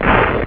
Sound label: tear cloth; Motorola MPX200